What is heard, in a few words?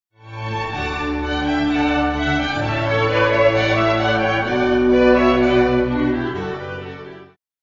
Examples of I-V-vi (Pachelbel melody)